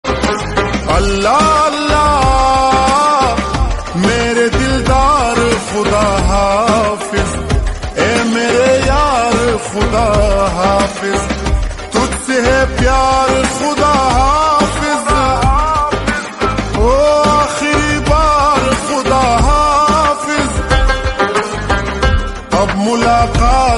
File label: islamic devotional